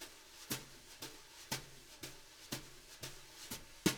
BRUSH LOOPS, Living Drums

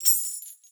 BELLS; Xsample VOL-9

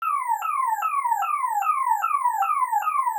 synth loop hype layer 150; Virtual Riot

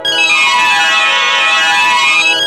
ETH SITAR; AMG - Now CD-ROM (Roland)

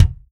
Real Drum Kit, ROCK KIT; Best Service ProSamples vol.43 - Real Drum Kits

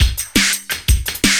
loop, 170 BPM, drum & bass

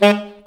SAX A. STAB, Alto Section; Roland L-CD702

